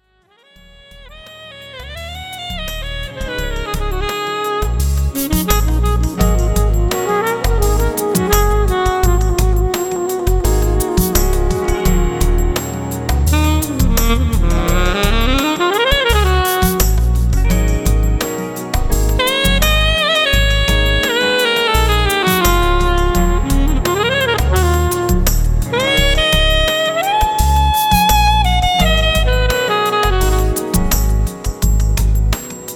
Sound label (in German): Alt Saxophon